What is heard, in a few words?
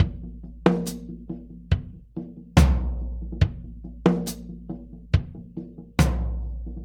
Earth Loop, Drum Tools, Laid Back; Sonic Foundry (Sony Creative Software); Loops & Samples Drum Tools; Gone to Earth